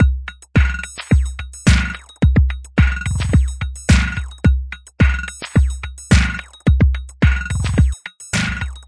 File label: bleepyrock, drum loops and breaks, 108 bpm; 101 to 110 bpm